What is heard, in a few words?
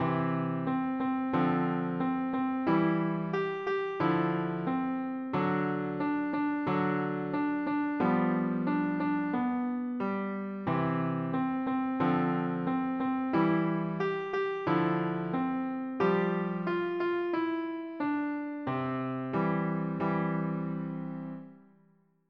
piano slow; slow tempo; PlayScore